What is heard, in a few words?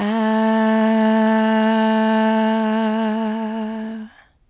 Vocal One Shot - A; Cymatics; Light Free Sample Pack; Bonus Samples; Vocals